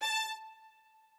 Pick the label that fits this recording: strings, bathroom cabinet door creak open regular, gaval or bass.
strings